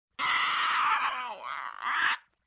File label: LoFi Synthetic Bird Caw; Vintage Cartoon; Comedy Vocals